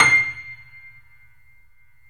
C, 3-D Audio Collection, 3D Pianos, Yamaha C7

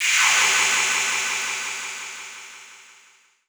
sci fi; Masterbits - Switch FX

